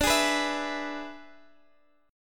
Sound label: E-Major D